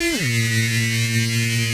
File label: 138 A#, alarm-fx, Techno; Techno ID; atmo+fx-loops